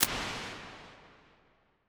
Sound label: Halls Hall R; Impulse Responses; Berliner; Hybrid; Ableton Live 12 Lite